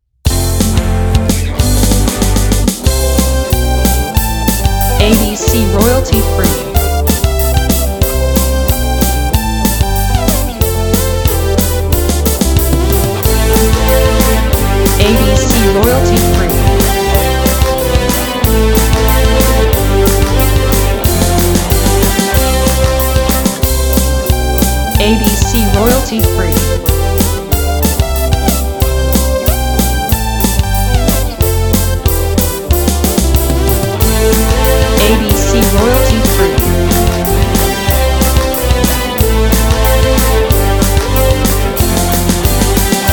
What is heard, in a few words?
Happy Theme Song